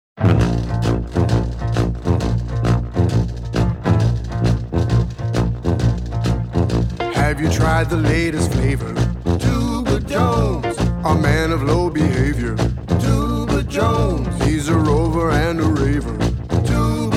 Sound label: Tuba